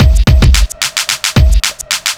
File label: block rock kit